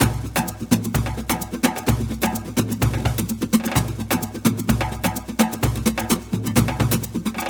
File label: Latin House Loop 128 BPM; Vengeance Samples